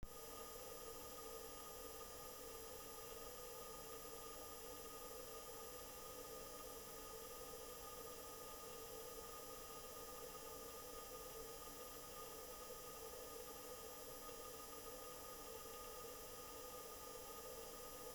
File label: perseverance rover sounds, Mars